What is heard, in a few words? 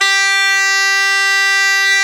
CHANTER G3; World Combo